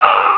DYING; BattleSNDS

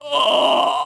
DYING; BattleSNDS

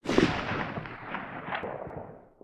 echo hills

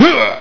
hit; BattleSNDS

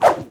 zombie swish

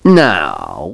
humm; BattleSNDS

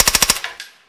silencer burst

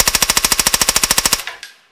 silencer burst

